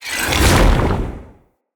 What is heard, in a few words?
Staff Caster Cast Long; Weapons; Staves; Dendrit Staff Caster